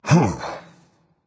breath berserker; cuchulainn

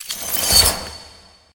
Activate; Smite; Susano

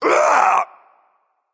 grunt attack, voicepack; smite; jorm

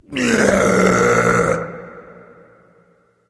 nv tongue; Smite; awilix

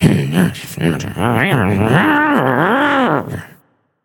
grumble, voicepack; smite; jorm

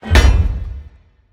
obelisk pound; smite; Anhur

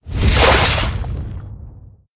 wall of spears; odin; smite

